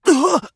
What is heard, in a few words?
Assassin Grunt Hit; Realm Royale; Shinobi